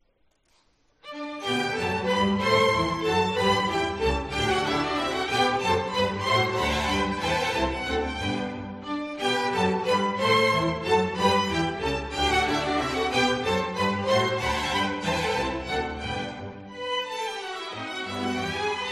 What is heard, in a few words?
Menuetto. Allegro; Wolfgang Amadeus Mozart; Eine kleine Nachtmusik KV 525